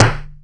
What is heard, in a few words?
studio acoustic snare sample f key; snare-drum-samples